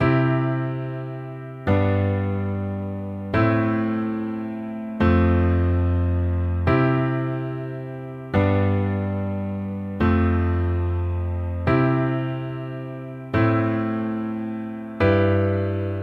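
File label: root position with left hand, accompaniment both hands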